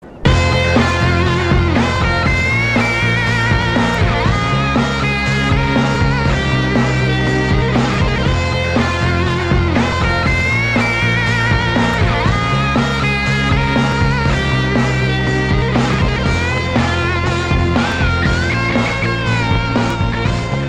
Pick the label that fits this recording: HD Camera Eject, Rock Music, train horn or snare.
Rock Music